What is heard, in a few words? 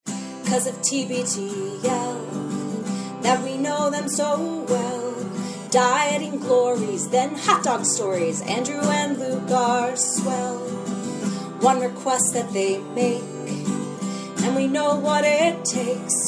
voicemail line jingle; tbtl